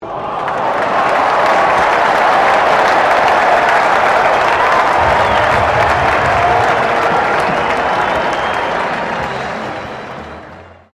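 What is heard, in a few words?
crowd 20K Melbourne Cricket Ground cheer high, Cricket at Melbourne Cricket Ground; Crowds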